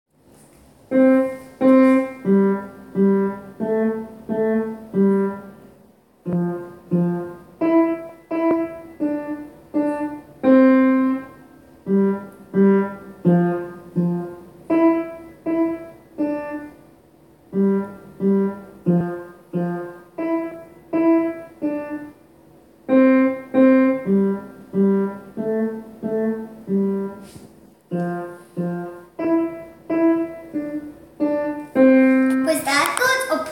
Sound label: Twinkle twinkle, Twinkle Twinkle Little Star Played on Upright Piano